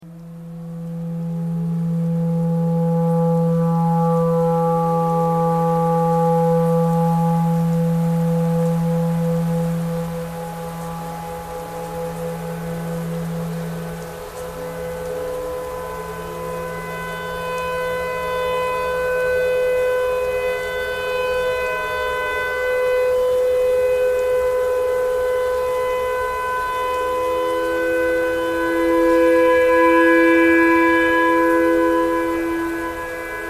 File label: tornado siren clarinet thread noise